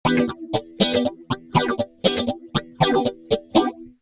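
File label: rhythm guitar, phaser; master phaser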